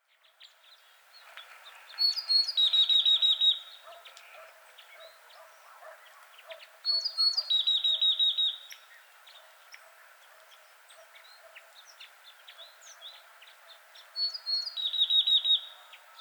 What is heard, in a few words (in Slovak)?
krivonos smrekovy